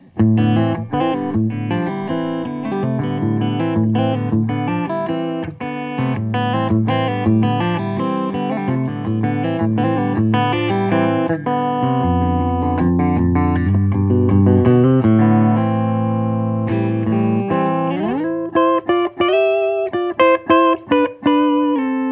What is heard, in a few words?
Rohr 15 1x12 Combo Gibson SG